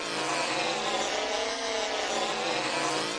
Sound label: up and down crackle tone loop; LOOPING AMBIENCE